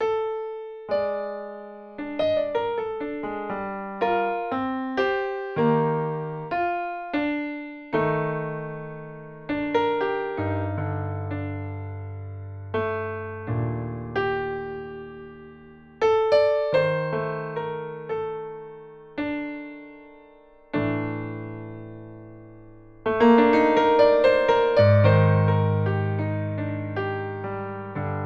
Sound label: Bechstein